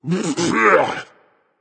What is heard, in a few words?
clear throat berserk; cuchulainn